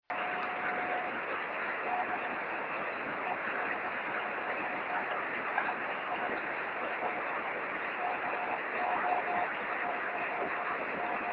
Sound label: 23cm beacon; sm7lcb; es0shf